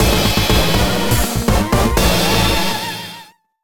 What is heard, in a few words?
Jingle Mission clear; Chousoujuu Mecha MG; nintendo ds gamerip